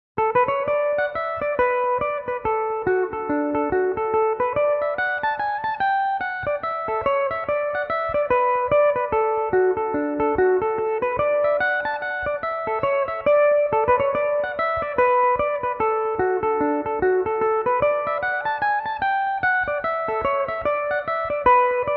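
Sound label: D major, Irish mandolin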